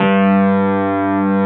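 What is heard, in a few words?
Wurlitzer G1 - L; USB Soundscan vol.09; AKAI; Keyboards Old School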